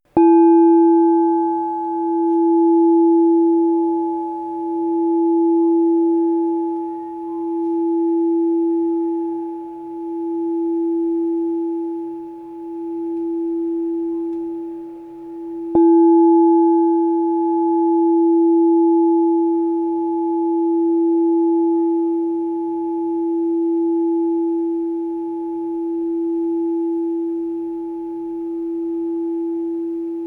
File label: 6 inch E +22, crystal bowl